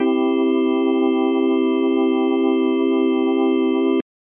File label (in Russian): organ akkorda do mazhor c